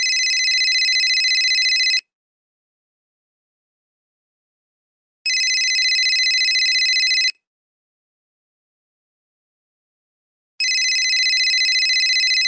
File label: iphone ringing tone